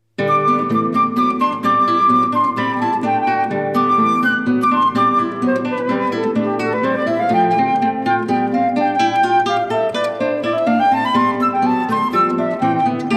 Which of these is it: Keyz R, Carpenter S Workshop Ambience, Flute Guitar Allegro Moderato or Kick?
Flute Guitar Allegro Moderato